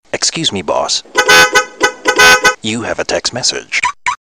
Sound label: New Text Msg